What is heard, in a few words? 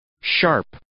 sharp